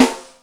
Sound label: Metal pic, Yamaha DTXpress Acoustic Snare